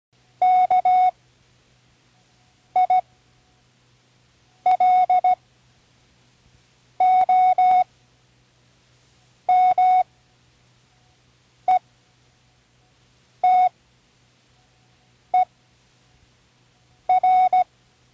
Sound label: words, Morse Code; Tune in the World